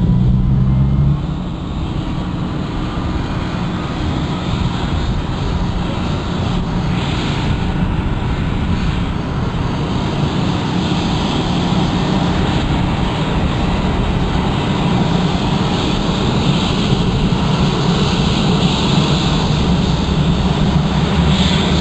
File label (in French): voiture